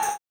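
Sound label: tambourine, 160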